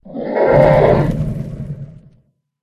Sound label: death; lord krofdor